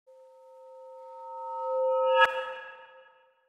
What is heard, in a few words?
ambient